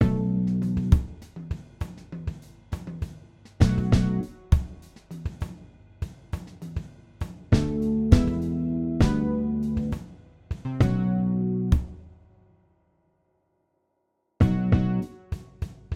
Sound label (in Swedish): Trumsolo